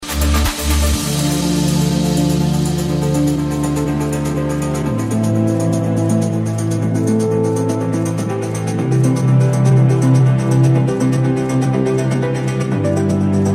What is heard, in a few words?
progressive melodic house